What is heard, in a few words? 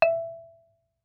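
Marimba rubber fortissimo E5; MIS Pitches - 2014; Percussion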